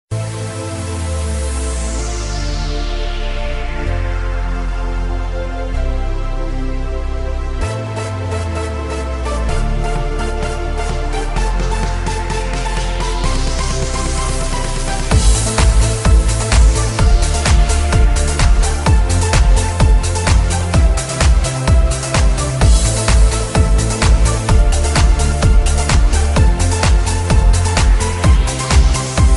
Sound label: Beat